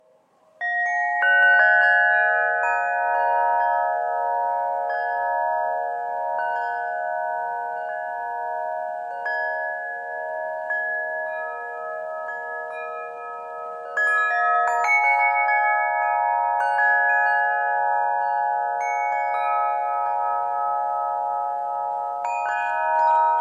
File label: Wind